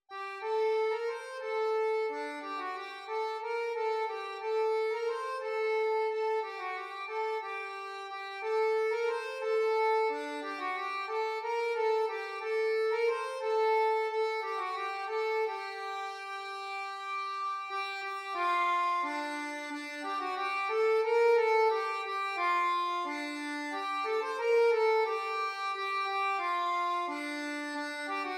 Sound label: valse